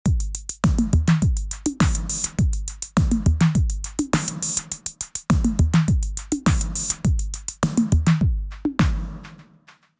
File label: Basic Kit